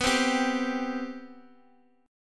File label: C 5th B; C5/B strummed